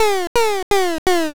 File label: witch laugh